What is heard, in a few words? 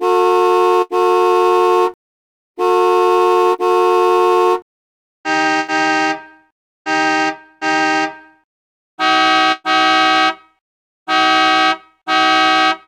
horns car mix; best service Blue Box